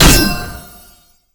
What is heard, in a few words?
hit; Smite; god Lancelot